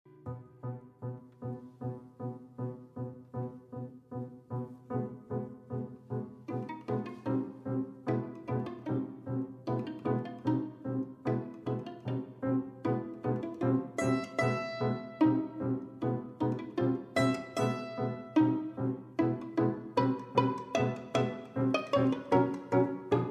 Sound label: Allegretto,Adagio-PIANO TRIO N.2 OP. 67; SHOSTAKOVICH